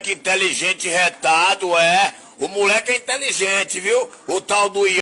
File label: horns; inteligente